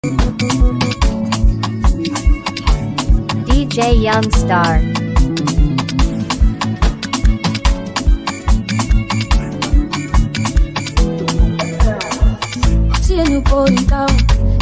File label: New Boy In Town Cruise Beat